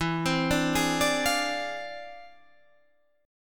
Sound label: E 7th Flat 9th Sharp 5th; arpeggiated